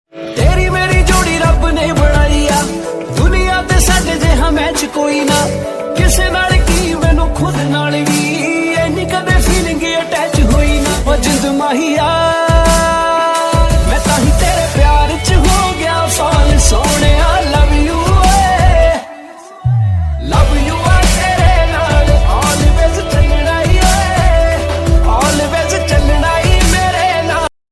Romantic Music Tone, Hindi, Only Music Tone

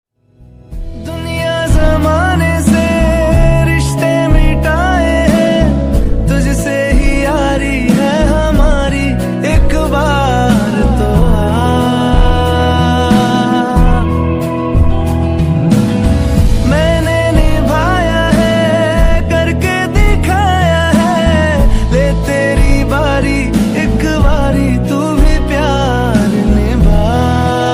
Romantic Music Tone, Hindi, Only Music Tone

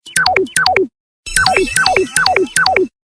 Samsung ringtone Connection

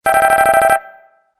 Samsung ringtone Minimal Tone

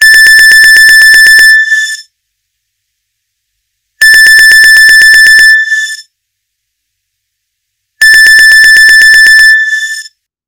Nokia Ringtone

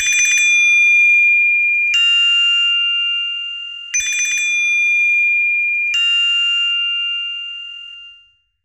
Nokia Ringtone Official Ringtone

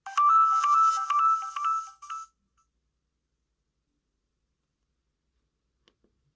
Nokia Sms Official Ringtone

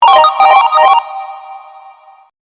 Tumble Tone, ringtone; Samsung

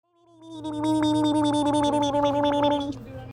Babble Ringtone

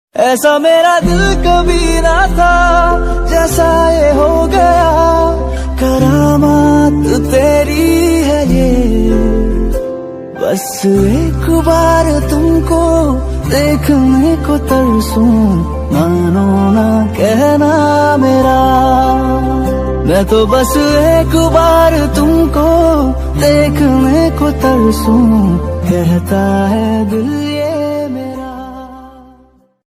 sad ringtone; mobile ringtone new love sad ringtone

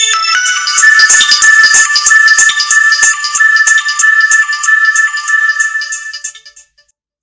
Nokia Ringtone

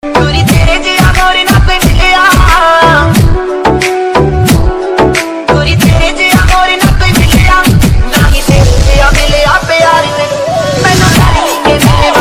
Punjabi song ringtone Love ringtone; Mobile Phone Ringtone

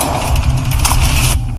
Level Up; Black Ops 2; Samsung Galaxy Tab A 8.4

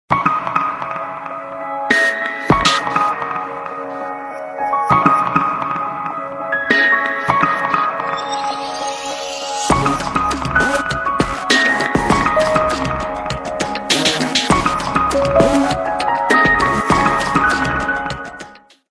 Mis en scene, ringtone; Samsung

